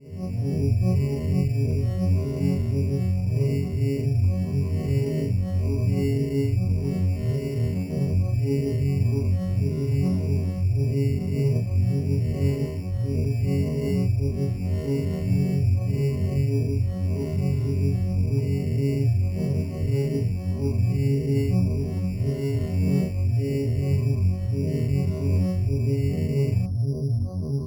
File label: Cm72 Zone Ambience